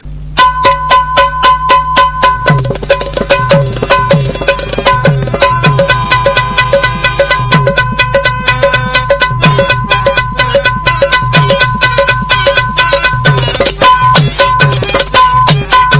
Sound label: Lavni Music Marathi